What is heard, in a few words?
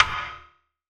rim, gated reverb; musicradar